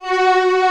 ST SA, SYNTHESIZER ANA STRINGS; AKAI S6000 CD-ROM - Volume 1

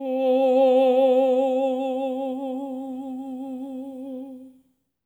TENOR C4, Voices; Spectrasonics; Symphony of Voices

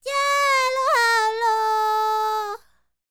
Vocal Planet World Voices, NOMADIC

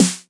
Old Skool House Snare

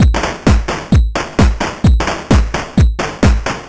Crushed Beat 130BPM; musicradar

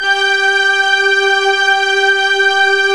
MLT TRK JX3P

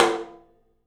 OPEN TOM; 3D Sound; 3D Drums

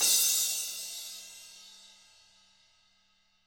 DW KIT; ILIO; Double Platinum Drums 1

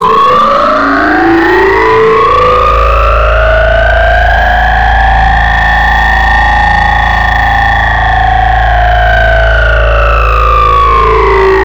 Lobelia, Pads; Zero-G; Total Drum Bass

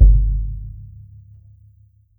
drum, mixed drums, World Instruments

